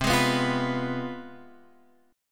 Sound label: C Major 7th Suspended 4th Sharp 5th, down, Guitar, Open F; CM7sus4#5 strummed